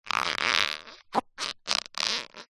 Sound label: foley balloon very lightly inflated handling creaks squeaks